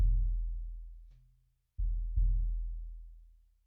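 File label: bass drum In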